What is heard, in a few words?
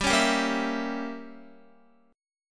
G Diminished 7th, Guitar; Gdim7 strummed